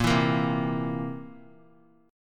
B flat Suspended 2nd Flat 5th chord, down, Guitar; strummed

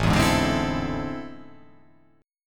A Minor Major 11th, down

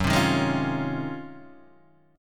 Ab 13th Gb

